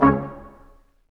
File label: BRS Q, Quintet stac, Symphony Orchestra, Staccatos; Roland LCDP08